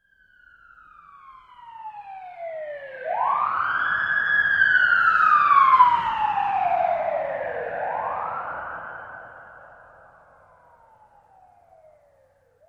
Police Siren: Tunnel Medium Car By, with Various Types.